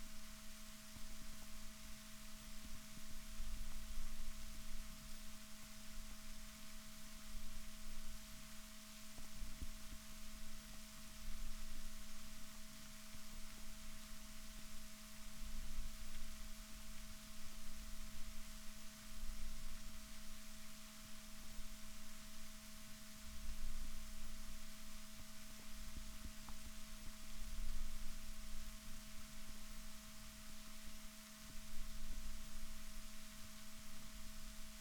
rorquals negatif, Balaenoptera Physalus Chile

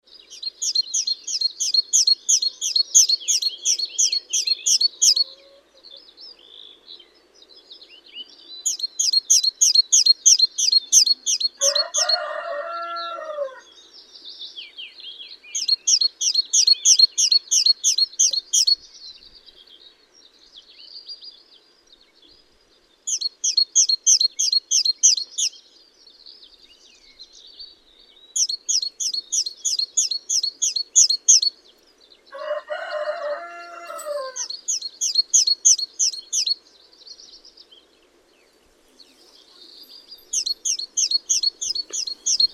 Parus major; SETE

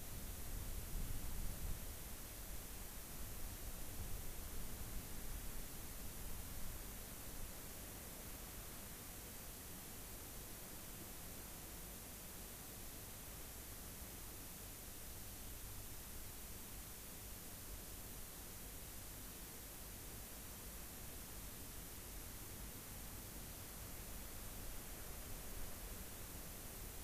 rayol farm; SEAMED

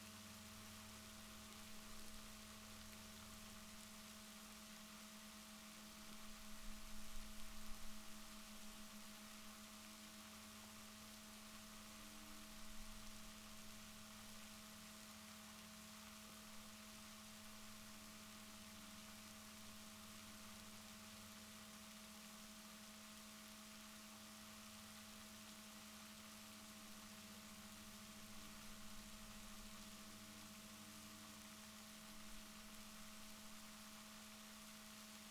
rorquals positif, Balaenoptera Physalus Chile

